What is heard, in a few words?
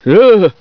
awww, hostage reactions; czero opforce